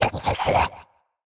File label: Attack Vo; portal quest android; Shadow Minion3